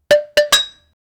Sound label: crushed soda can hitting; crushing